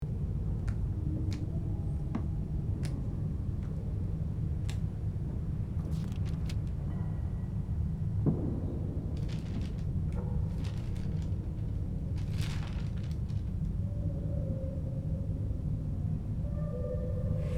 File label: Cryptic sinister library ambience exploration loop